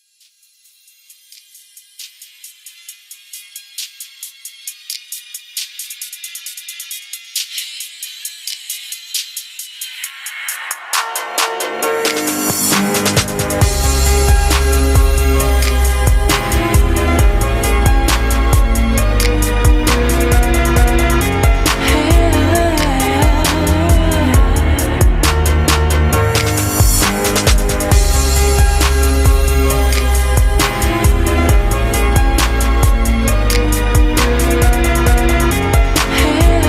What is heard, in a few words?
beat